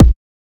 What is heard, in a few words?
drum hit clap; izduh v4red temu